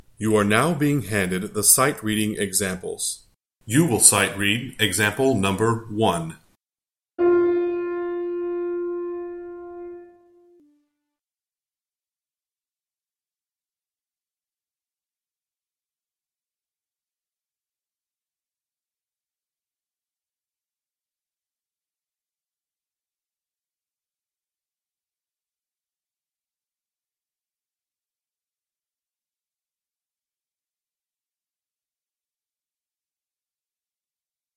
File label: Sightreading Example